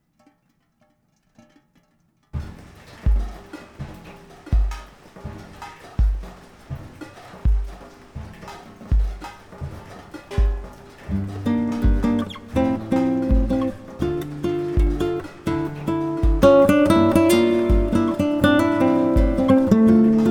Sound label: Rain Bossa